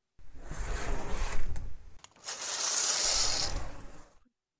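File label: curtains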